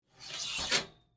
Hanger Slide Hotel, Household, Hanger, Slide, Hotel, Closet; Bedroom (Sound Effects:Household)